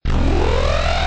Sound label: start big hit, robot; mob